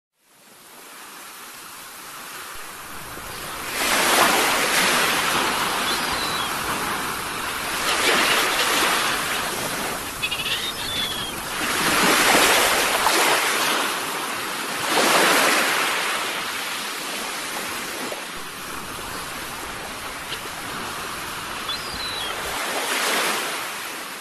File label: Beach birds